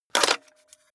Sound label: cassette out of cassette player